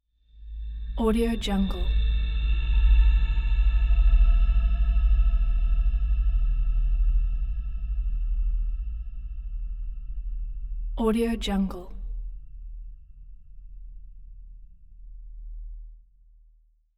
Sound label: eerie low drone; hollywoodedge